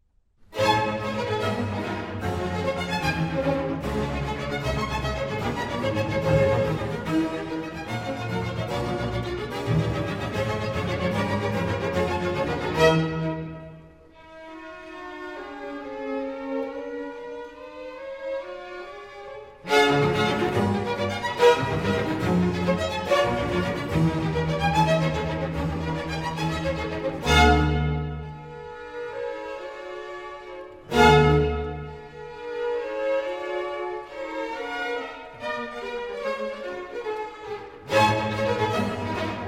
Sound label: Cello Concerto in A, Allegro assai; H439